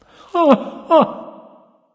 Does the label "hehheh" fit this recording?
Yes